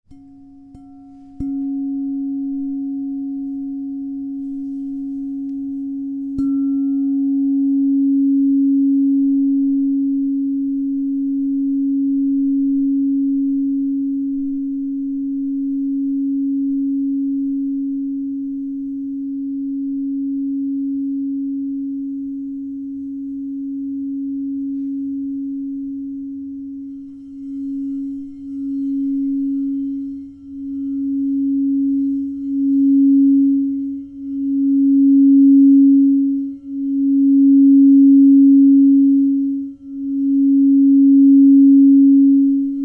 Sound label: Crystal Tones Singing Bowls